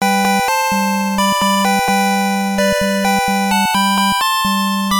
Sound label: musical code